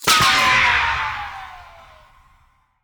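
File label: ricochet; gmod; viper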